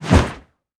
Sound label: Basic Swish M